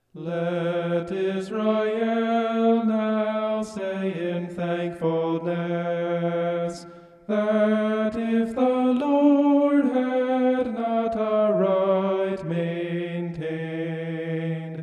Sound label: vocal homoph; psalm 124